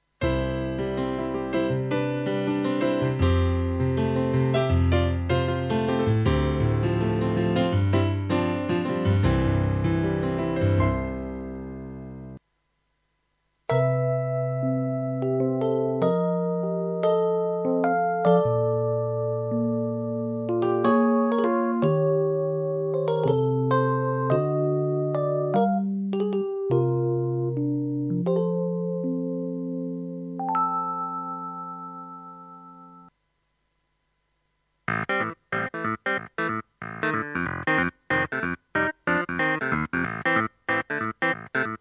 solo keyboards

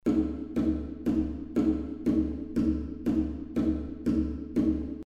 Conga Set Hall

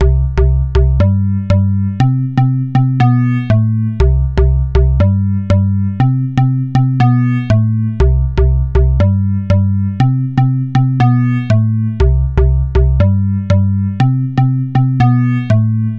Behringer Neutron Patches Organ House Bass

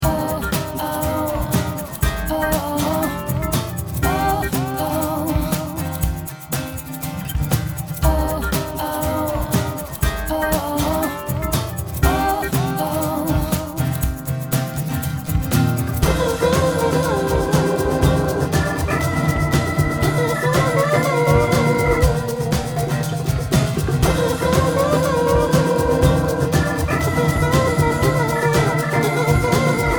Song w/o Bass

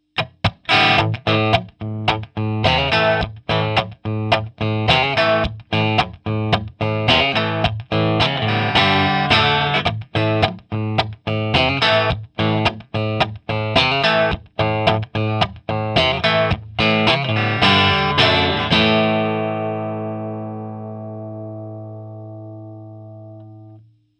Strat Bridge PU

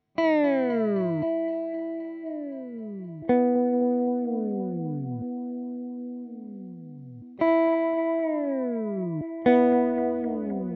Poly Effects Beebo Intersection Tape Stop

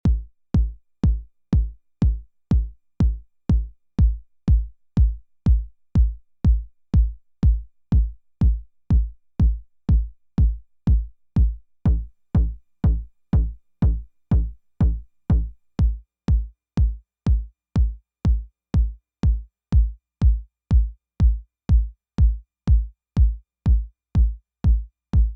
Techno Kick 909; How to Techno

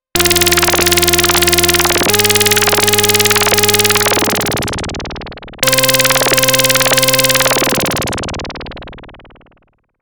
Roland SH-101

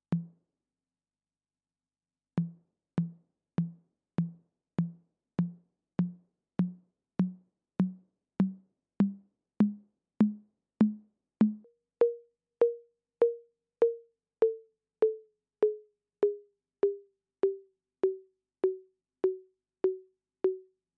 Hi Tom Conga

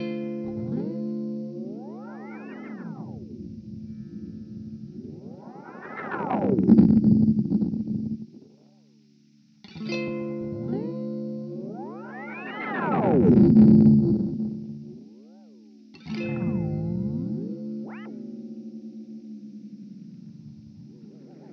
Delay Oscillation; Teisco

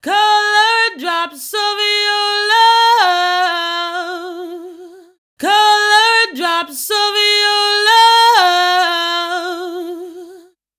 Vocal Presence Boost

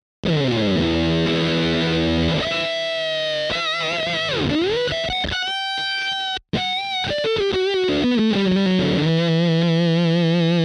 DFST-1 Lead

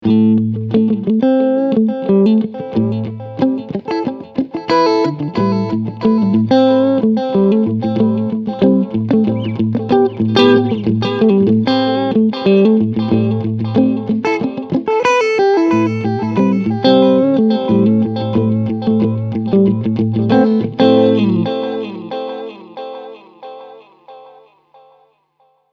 Medium Delay HP Filter

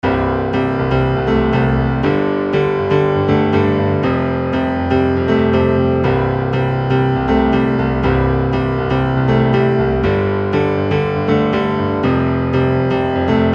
Grand Piano Synth Pop Standard; EZkeys

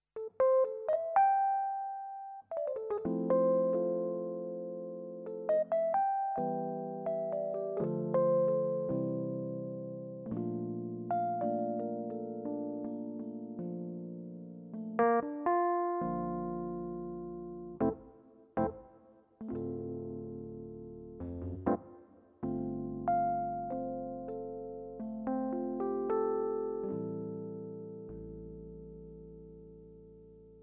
CP4 Stage EPiano

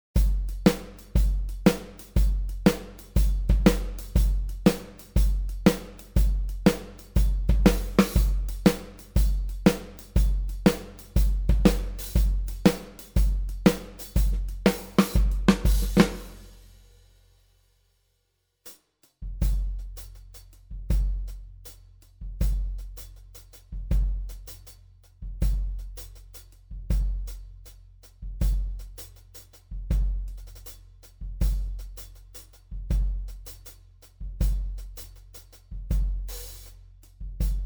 Ludwig Concert Kit; Superior Drummer 3

